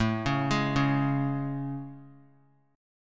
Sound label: A Major 7th A; AM7; arpeggiated; JGuitar